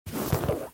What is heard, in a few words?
open bag sound